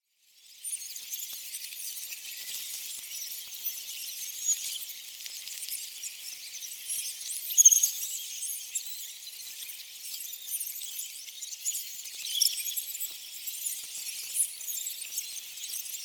Rats Ambience - Many Rats Sewage, Twittering, Fast Moving; Hollywood Edge; sound designer's toolkit v2